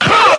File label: "huh"; Voice; ooh ahh